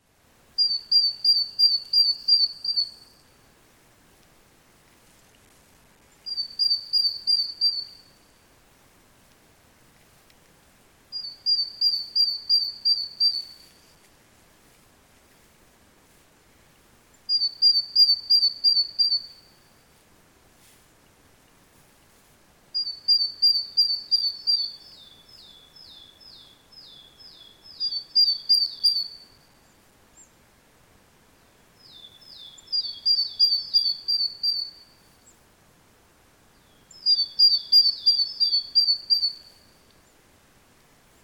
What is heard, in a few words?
Parus major; SETE